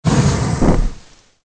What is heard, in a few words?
Dragon